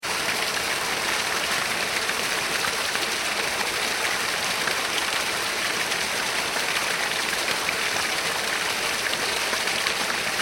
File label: water waterfall small; Rivers; Nature; McKinney Sound